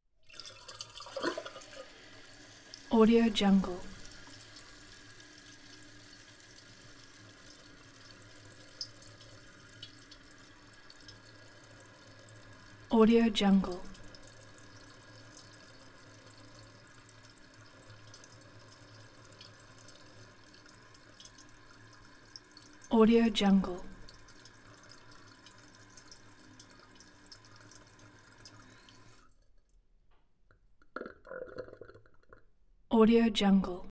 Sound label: toilet fill gurgle end